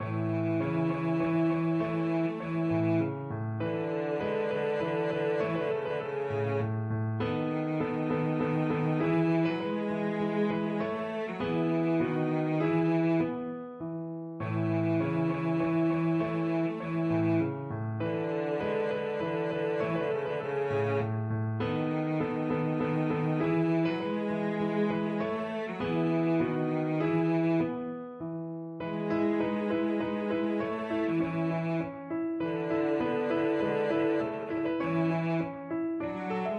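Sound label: violoncello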